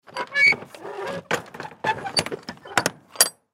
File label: Semi Truck Trailer, Semi Truck Trailer Rear Door Latch; Semi Truck Trailer Rear Door Open Latch; Trucks (Sound Effects:Vehicles); Blastwave